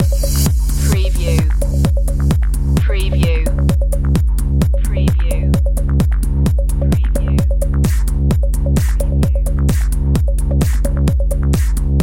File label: the club loop